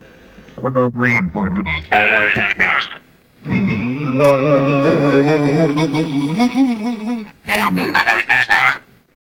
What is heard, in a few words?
generate a close up raw, football